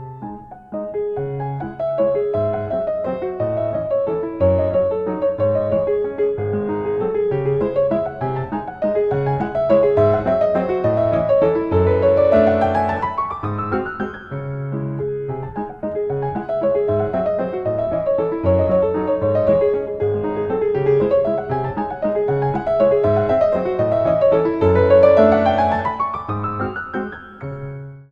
waltz in c sharp minor ringtone; chopin; op 64 no 2

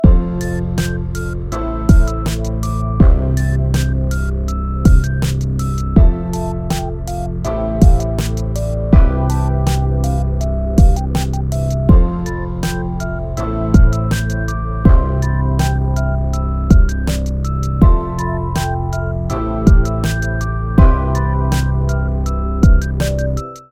lazy

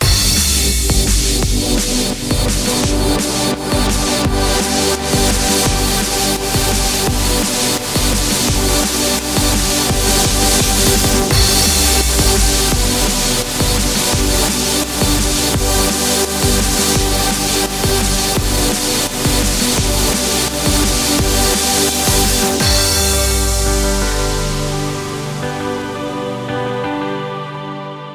anticipation ringtone

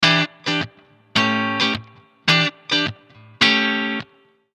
funk guitar

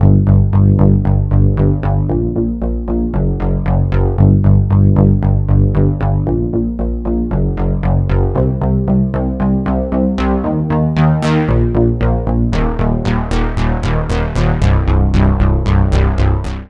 bass synth ringtone

